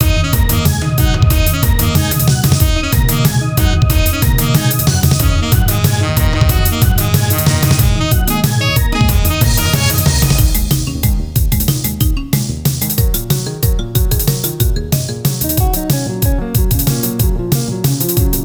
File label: game theme